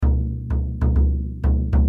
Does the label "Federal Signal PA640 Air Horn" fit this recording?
No